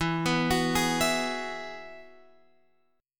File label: E Major Add 9th E down Guitar Open F; arpeggiated; JGuitar